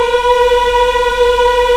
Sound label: VOX African; Roland LCDP11 Africa VOL-1